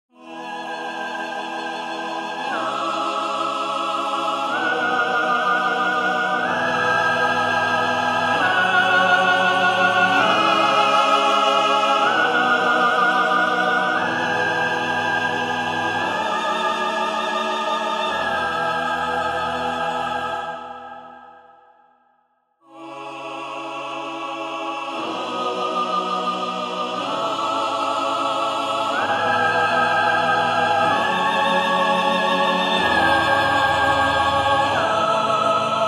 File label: counterpoint